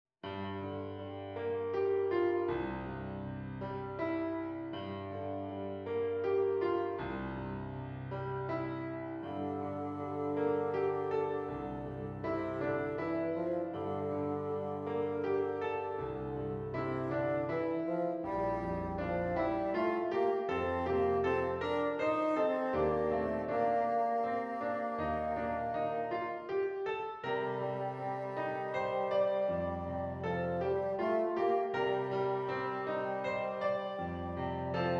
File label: Bassoon